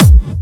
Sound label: Clubby Kicks, Bassdrums